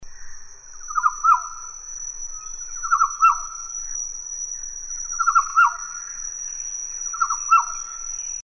kepodang seram

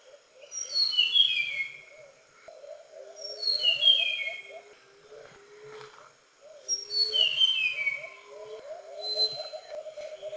sikatan bakau

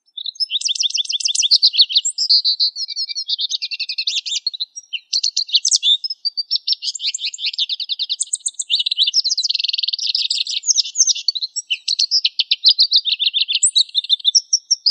goldfinch untuk kenari isian

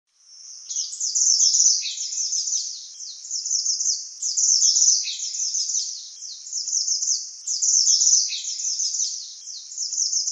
suara sepah gunung

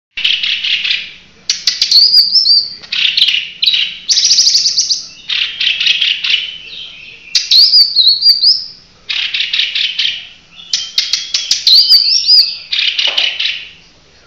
cucak hijau kepala kuning

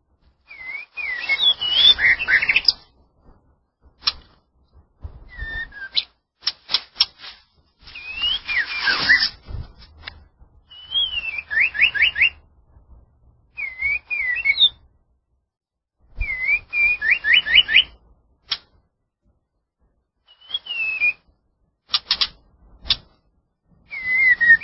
suara alarm muraibatu

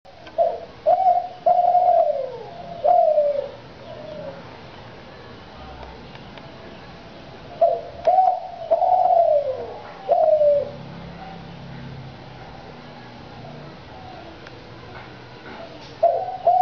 suara burung derkuku bantul

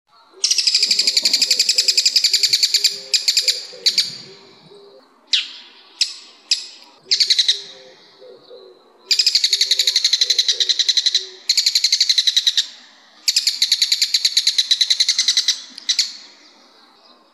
suara bull headed shrike

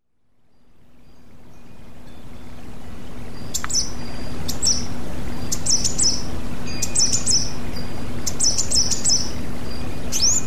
terapi alam kolibri betina

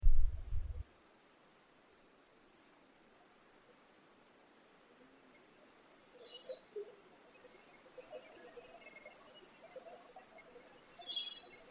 suara anis enggano

